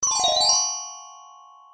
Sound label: Reset Button pitch